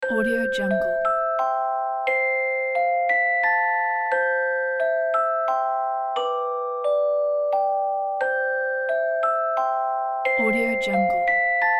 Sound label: lullaby